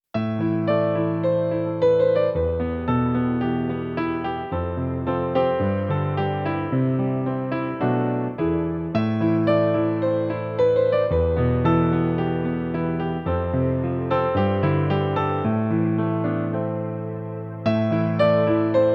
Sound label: Piano Intro